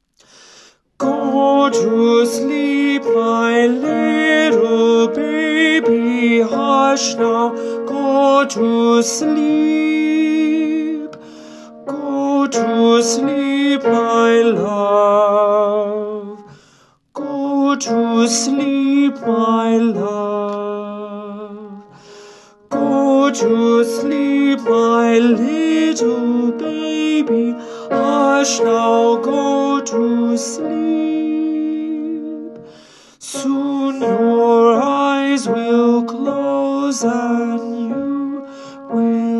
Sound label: Sleeping Baby Song, piano solo